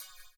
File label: CYM, Ethno Techno; ILIO - Ethno Techno